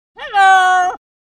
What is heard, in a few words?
Macaw Vocalizations